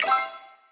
SFX Reveal Unpicked Number; slots; Panda Majesty2

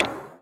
SpinStop; slots; Sugar Town

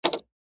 Spin Stop; slots; Ninpo Slash

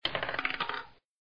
ball Drop, Roulette

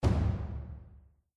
spin stop, slots; Realm Saga

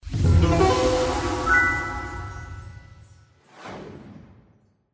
dice Win Display; Sicbo

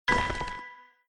BONUS PICK; Jurassic Jungle

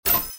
Btn Click; GalaxyQuest

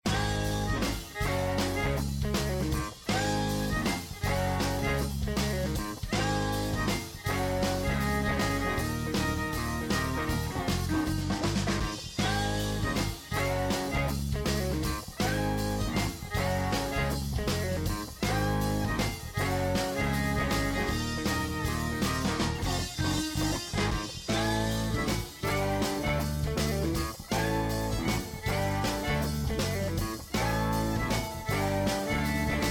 BGM Main UI, slots; Big Paul

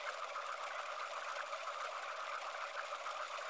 Spin, SLOTS; Lucky Wok

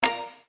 Spin Btn; slots; Wealth Gods2

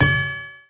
Spin Stop Special Wild; slots; Ancient Scrolls

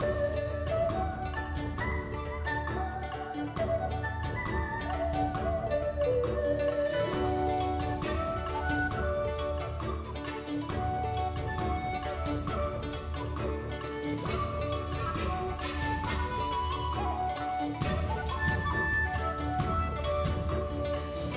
BGM FREESPIN; Lucky Sakura

